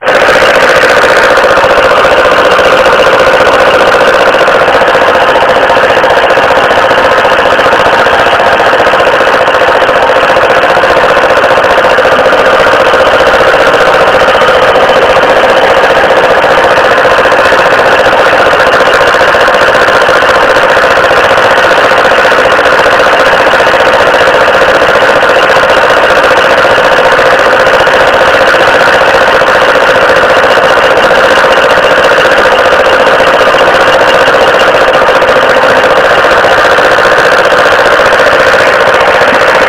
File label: ticking chattering noise from motor